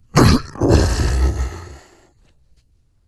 die

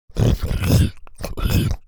eating; lin gang eater

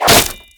arrow impact concrete; weapons

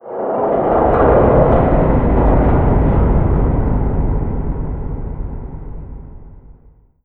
spacebase rumble; Garry's Mod; tf2

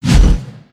strongman fast whoosh; Garry's Mod; tf2

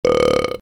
burp; vo

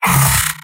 soldier pain sharp; mvm

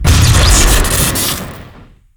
reserve shooter crit; tf2

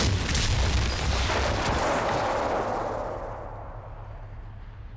railgun firing; skbt famale agent; astro glitch

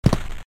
walk step, footsteps; vinagre npcpack

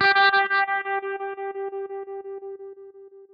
class menu, music; Garry's Mod; tf2

